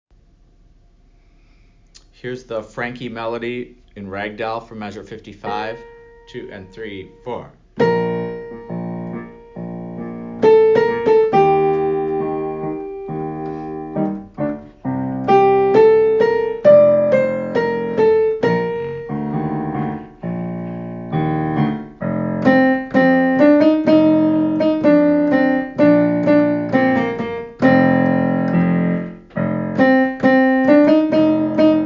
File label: Melody